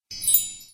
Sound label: click; fairies